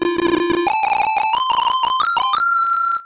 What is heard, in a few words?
Trumpet; High School Kimengumi; Master System